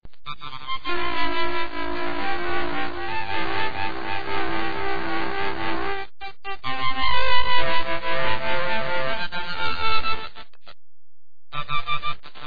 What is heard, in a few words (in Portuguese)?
orgao